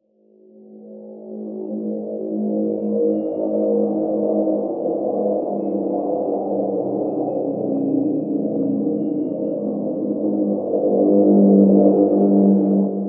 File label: Pad; Dubstep Sample Pack